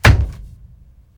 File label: metal thud